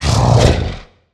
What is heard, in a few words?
Humanoid Snort; The Decline Of Warriors; Monster Sounds Volume II